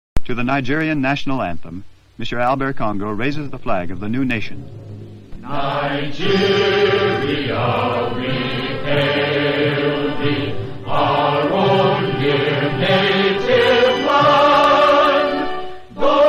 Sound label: Nigeria New National Anthem Full Version